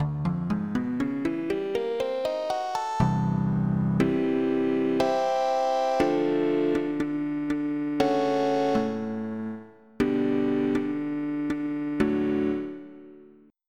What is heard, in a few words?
Synth Bass; FF7DEMO